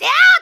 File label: Spin Attack; Link's spin-attack shouts